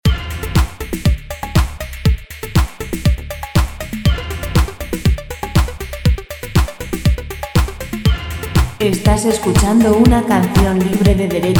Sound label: 125 dance